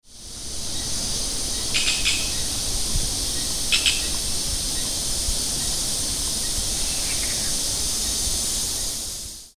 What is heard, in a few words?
Piaya cayana Alma de gato